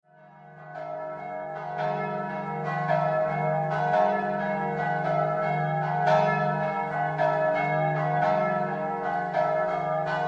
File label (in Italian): San VOLFANGO, campane